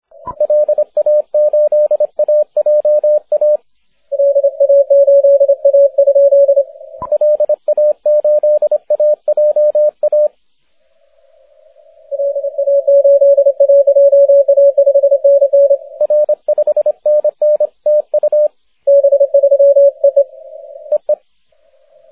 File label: 6m cw